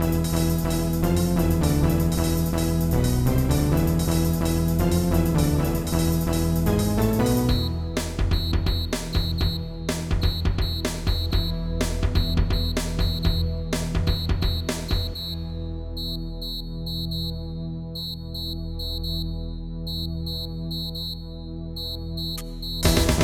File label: techno; 3800 MIDI Magic; modern